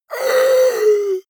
monster inhale; kreaturen

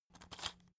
salt mill rattle; berufe; gastronomie

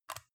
metal device click lock in soft; industrie-wirtschaft

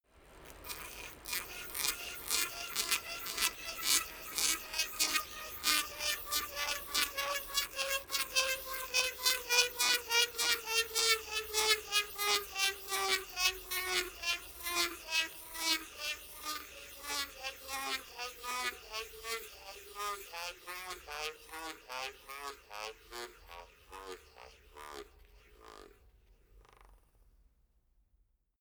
bicycle spin tire scratch plastic; fahrrad; verkehr transport

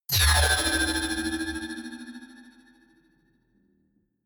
sci-fi magnetic sling big

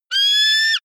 eagle call; natur tiere; voegel

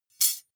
midsize metal thin clank drop

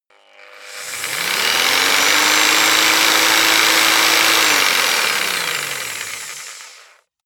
jigsaw engine medium slow long

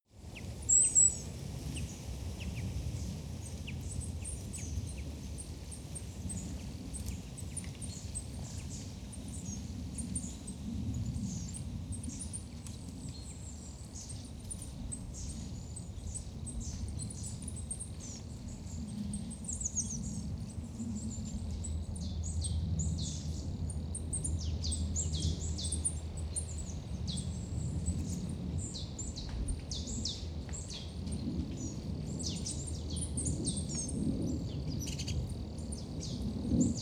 wind rustle birds plane, wald

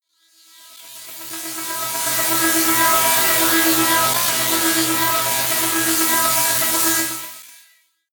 industrial riser long; risers

